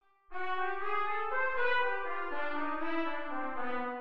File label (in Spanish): Maj7 inv0 en C; El Pepo